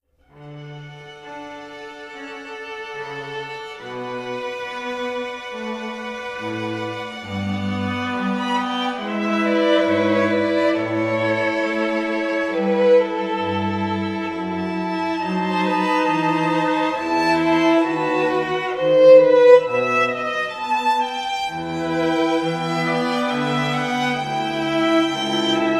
String Quartet; Air On The G String; Bach

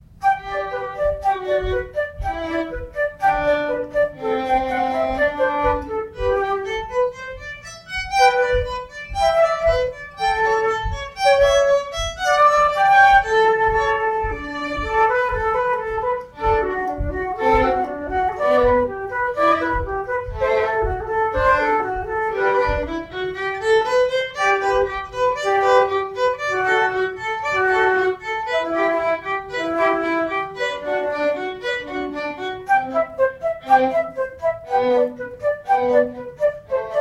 Light Classical Flute And Violin Duet; jazz band; Eddie Stevens Music

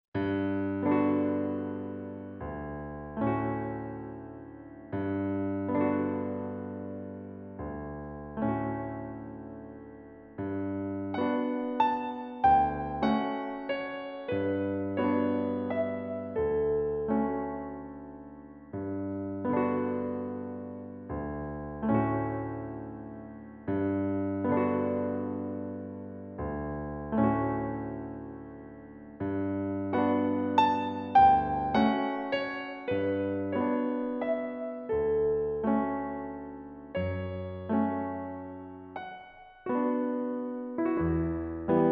Piano

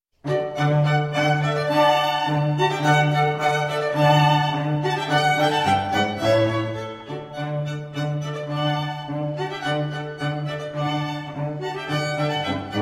String Quartet; cello; Fine Arts Ensemble

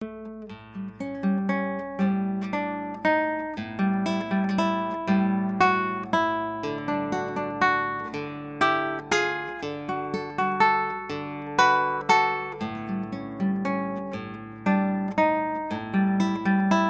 Waltz, Classical Acoustic Guitar

